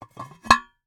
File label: Cookie Jar, ceramic, glazed, irregular shape, hollow base, hollow top, rough, resonant, tonal, open; fast; Eiravaein Works; Vaeyan IV